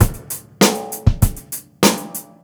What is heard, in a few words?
beat, roots funk